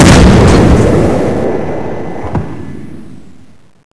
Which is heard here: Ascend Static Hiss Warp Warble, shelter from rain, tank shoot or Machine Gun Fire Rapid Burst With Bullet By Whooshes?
tank shoot